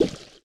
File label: Jelly Egg Empty Pop